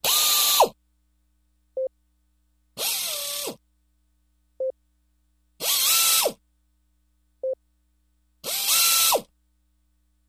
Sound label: Air Motor Drill